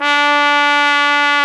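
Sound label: BRS, Brass Trumpet f; Roland L-CD702; Tpt 1-4 Solo; Tp 1 Class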